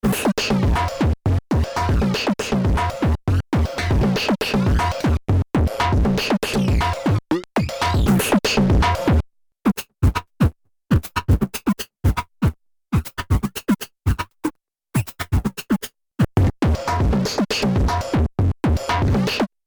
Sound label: Drum Rotator